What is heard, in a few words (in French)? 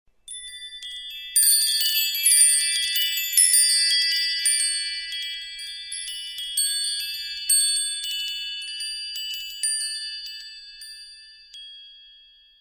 reveil en douceur; ringtones